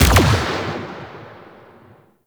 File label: Corpus Arachnoid Camper Turret Fire; Warframe